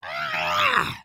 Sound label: flail; creatures organic